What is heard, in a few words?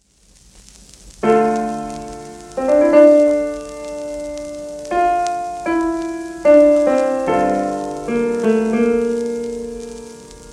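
sonata for violin cello and piano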